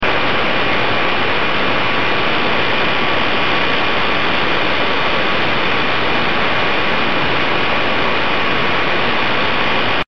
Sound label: static